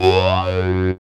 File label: SYN, Roland; Sound & Vision - Gigapack II; Morpheus